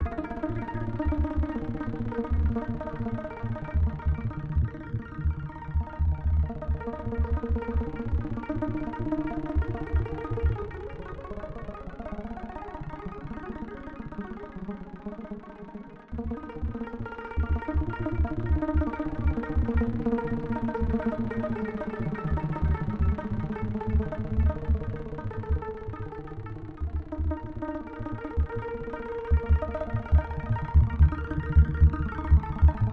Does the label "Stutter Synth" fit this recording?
Yes